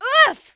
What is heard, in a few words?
clown female throw; Peeps Clowns; clown female b throw 0.wav